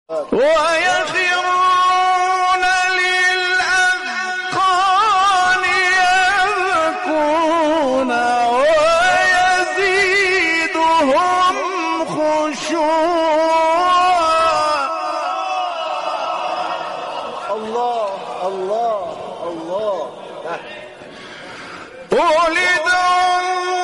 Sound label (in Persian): تلاوت آیه سوره اسرا; استاد احمد ابوالقاسمی